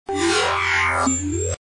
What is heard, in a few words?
Scifi